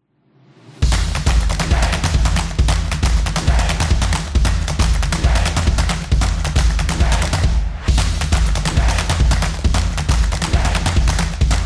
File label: Percussion Claps; football promo